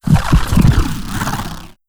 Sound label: heartbeat, creatures